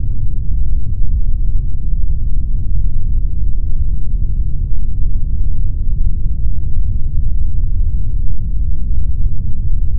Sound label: polarity low rumble OK